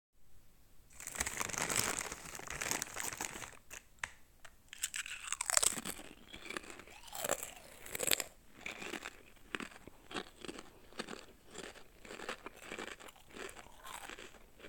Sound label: eating chips